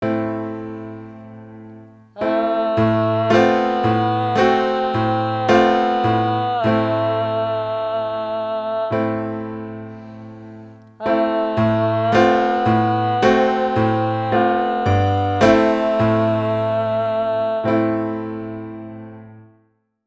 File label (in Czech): dvojhlasy piano a zpev